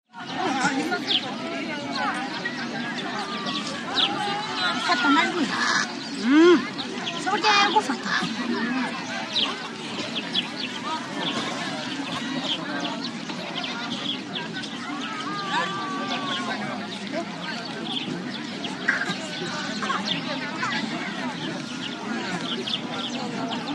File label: Open Air Market Dist, spanish